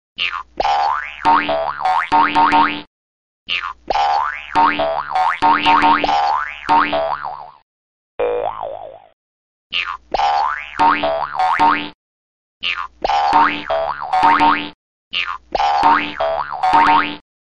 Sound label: BOUNCING BALL SOUND